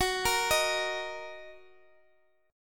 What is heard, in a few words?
Gb 6th Gb down Guitar Open D